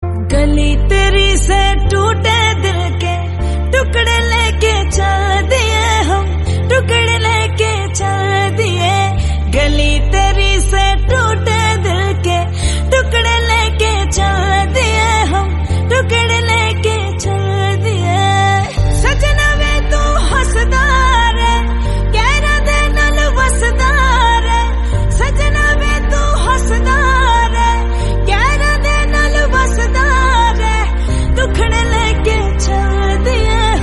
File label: Hindi Song